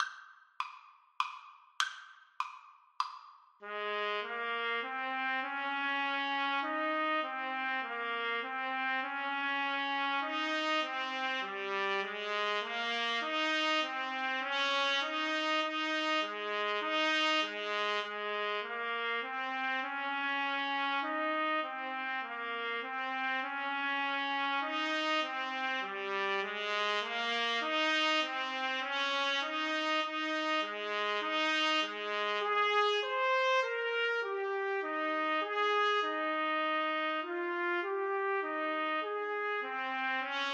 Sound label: Menuett trumpet duet